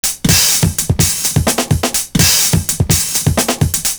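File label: PAISTE HIT, 126 bpm; Univers Sons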